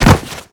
bodyfall stone; Call of Duty Modern Warfare

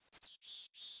birds; World of Tanks Blitz; hangar blitz

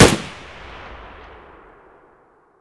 vpo209 fire close; Escape from Tarkov

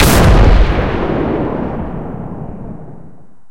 cannon 120mm shot, cannons tanks

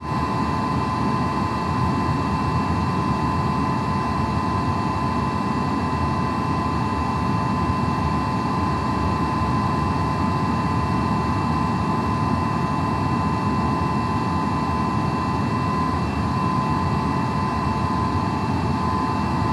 ship engines; World Of Warships

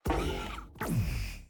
ship engines; World Of Warships

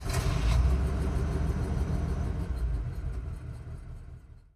engines; World of Tanks

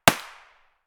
plastic distant; Escape from Tarkov

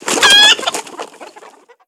animal chicken disturbed

